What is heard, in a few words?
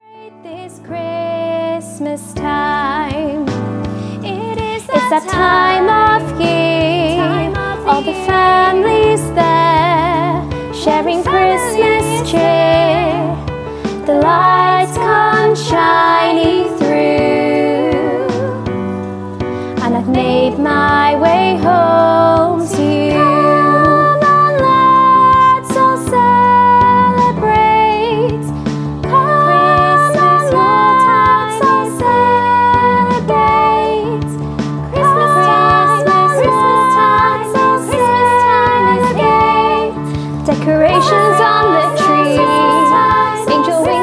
live version of 4 part song for Ladies Choir